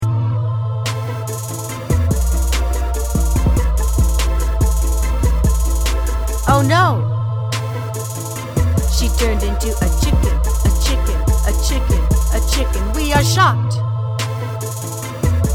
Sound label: vocals